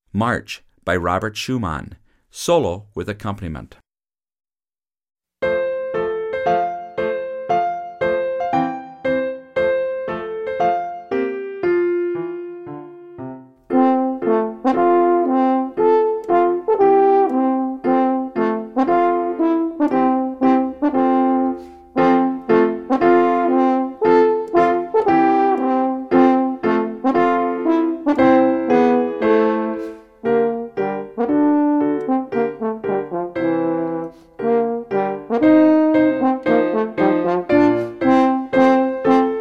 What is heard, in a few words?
Horn March Solo and Accomp